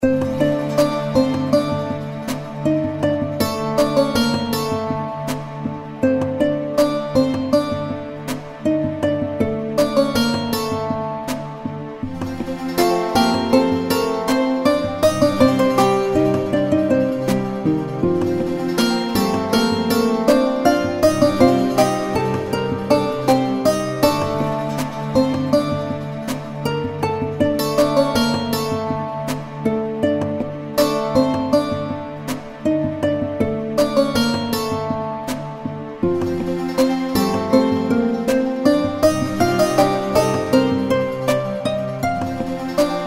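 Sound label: reel spin background, egyptian mythology; games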